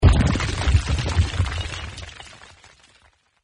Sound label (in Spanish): avalancha avalancha